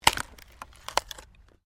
WOOD PLANK TWIST

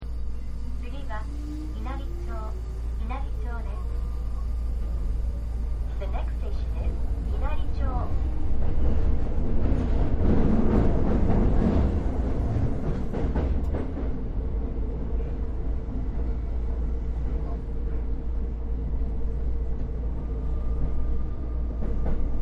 Tokyo Quiet Train; Trains; Vehicles

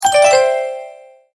multimedia tone alert

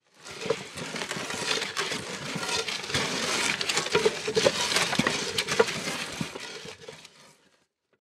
Wheel Barrel Push, Wheel Barrel Push Through Dirt; Miscellaneous Household; Blastwave

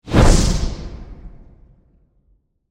Magic Spell Impact, Horror, Magic, Spell, Impact; BlastWave

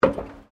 FOOTSTEPS WOMENS LOW BOOTS ESCALATOR STEP; Footstep Foley; Human

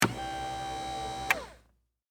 Servo Motor Short, Hospital, Bed; BlastWave

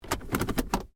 Hyundai Accent Auto Gear Shift; Hyundai hire car; Accent 20 08; Cars; Vehicles

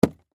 Cardboard Box Drop; Foley; Blastwave

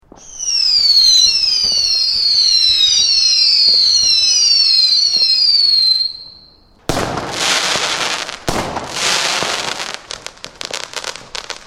Various mixed fireworks from bangs to screamers; Fourth of July

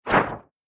Texture Whoosh; Whoosh Sound Effects

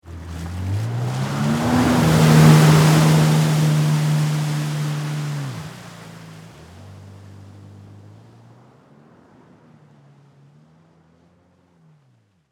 Jet Ski Away Fast, Watercraft; Transportation; Boats; BlastWave

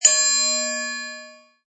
Metal Long ding; Metallic Sound Sound Effects

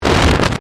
Distortion Wipe

